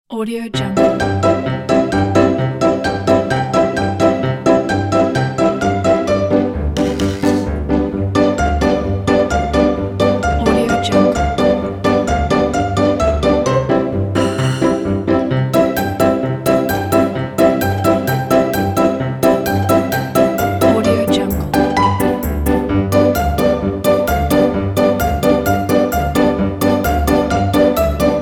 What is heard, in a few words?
this is comedy funny